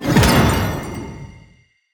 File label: hero ultimate blue; crocs